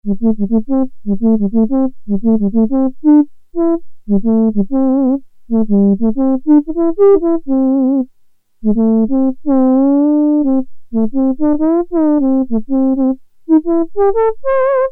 Trumpet Flute; Sequential Circuits Prophet-5 (Ver.3); Factory Patch

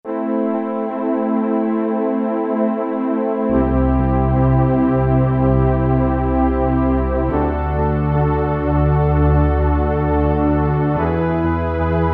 syn echoist; E-mu Proteus FX; Factory Presets

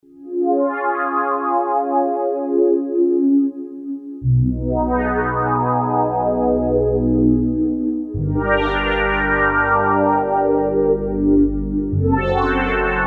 PAD; Akai SG01v; MYSTIX

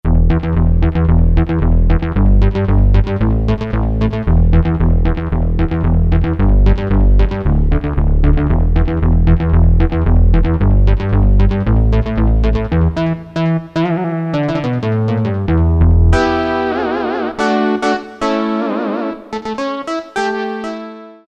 Moog LP, MIDI Combos, Korg DSS-1

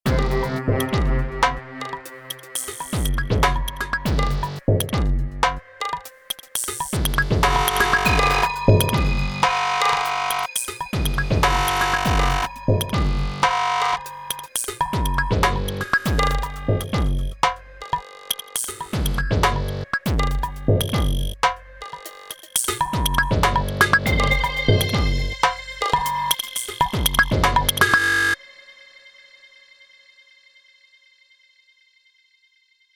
Ambi Groove, Korg Radias-R

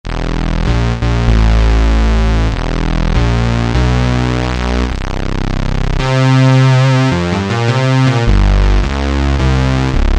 Taurus Pedals; Greytsounds Philip Wolfe's Rock Keyboards

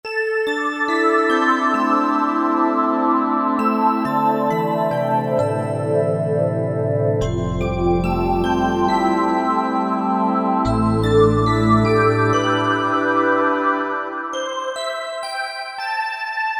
Dream Bell, Korg MSC-9S Organ

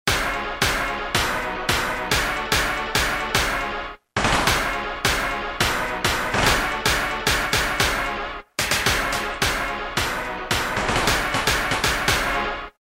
Triumph Stab; Roland M-DC1

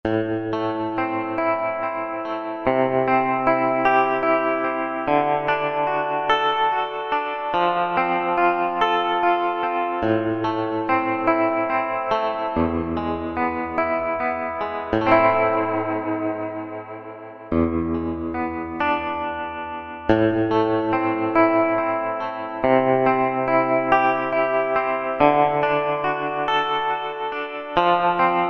Roland SR-JV80-09 Session; Duanne'sTone

